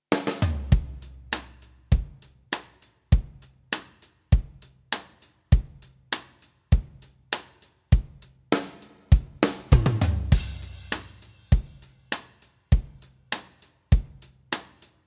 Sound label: drums 2 bloom